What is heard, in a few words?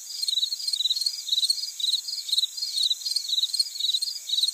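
cricket loop, ambience; source engine; portal2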